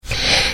inhale; singing together

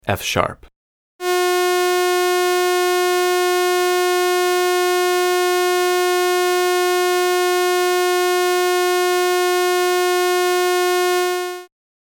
flute clarinet; jargon tunings; wind

